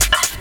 drums, combi drums; PS-28 Vocal House Samples

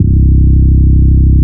Basses C; Vengeance Samples

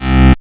Offbeat Bass C, Bass C 134; Vengeance Samples

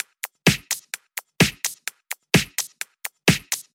Drumloops 128BPM

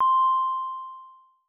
synth poly c4

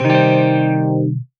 Synths C; Vengeance Samples

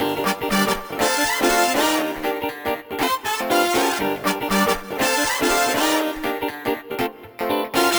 Special Sounds Root G# 120BPM; Vengeance Samples; VEH3 Special Sounds

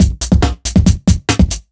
Breakbeat; Vengeance Samples; VEC2 Loops

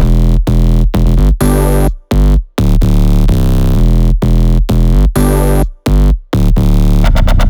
Sunshine Melody 4 Root E, 128BPM; Vengeance Samples; Sunshine Kit

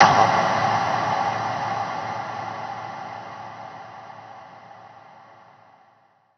Reverb Athmospheres, FX Vocals; Vengeance Samples

